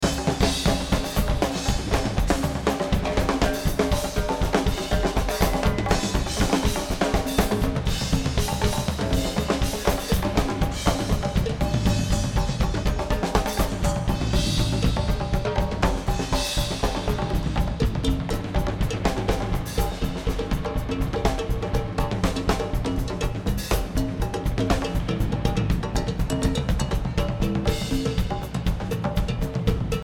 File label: Drums